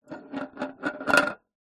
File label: foley tin small metal empty down spin wood surface; zapsplat